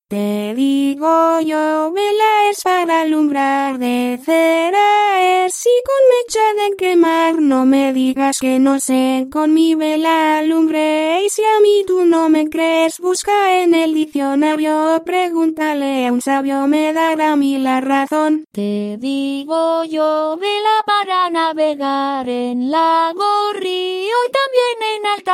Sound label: vocaloid